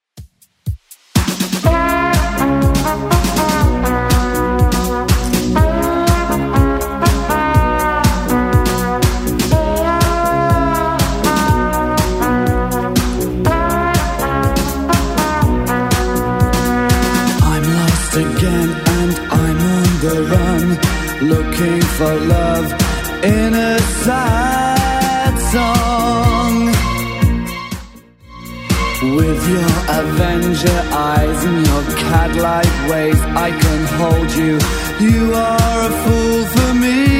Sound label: 80s Redrum 122bpm